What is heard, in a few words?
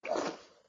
Suck; Lewd SFX